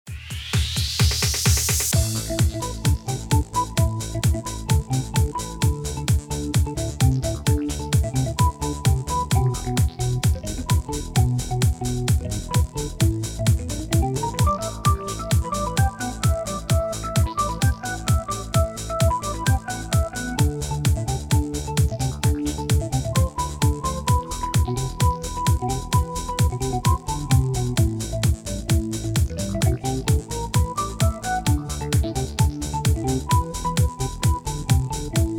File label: M1 ORGAN